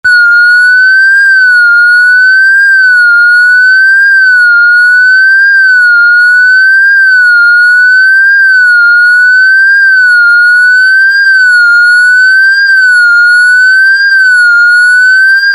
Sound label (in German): Aussensirene